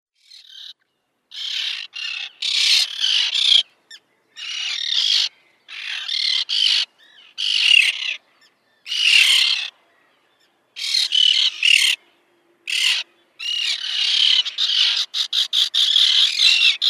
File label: Common Tern downy Young